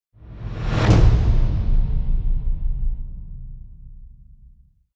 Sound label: Swish